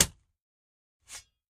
Shovel Stab Dirt

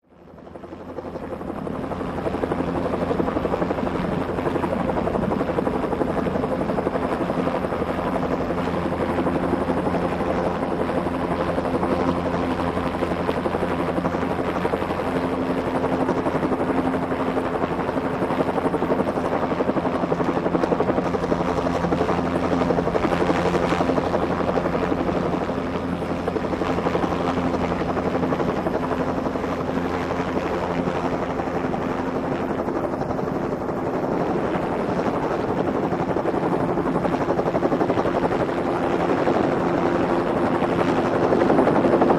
Jet Ranger Hover Medi

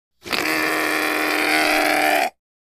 Bleep Horn Irritatin

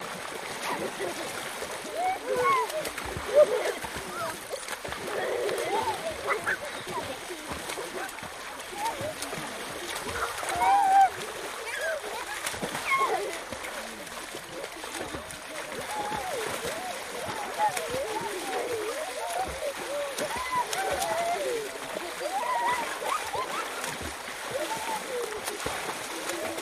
Kids Venezuelan Play, kids play

